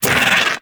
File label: Bug Vocal Pain; Characters; Warframe; Hunting Critters; InfKDriveBug